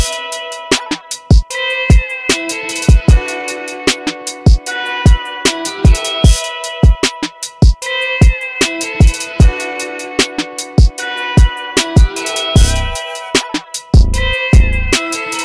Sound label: English Melody Ringtone